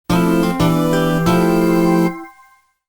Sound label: jingle